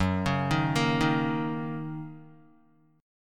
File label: Fsharp 6th down Guitar; arpeggiated; JGuitar